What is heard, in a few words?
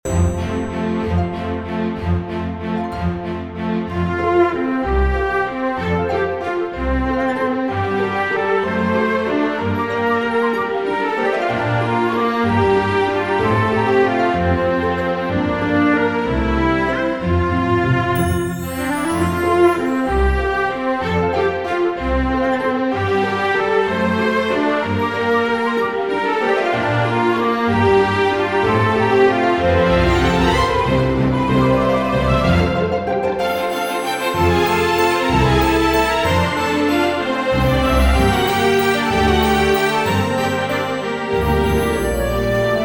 Royal Waltz Loop